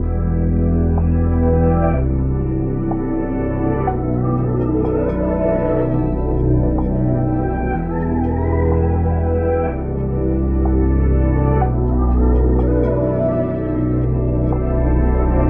124 BPM E Min; Cymatics; 2022 Melody USB Expansion; Heaven

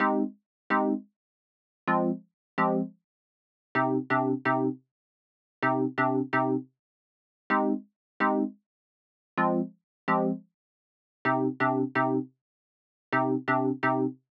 128 BPM C Min Juno-60 Chords, Synth; Loops; Loop Stems; ARP+JU60; Cymatics; Fantasy Synth Sample Pack; Fantasy - Yesterday

